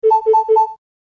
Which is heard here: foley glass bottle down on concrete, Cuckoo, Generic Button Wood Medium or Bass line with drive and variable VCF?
Cuckoo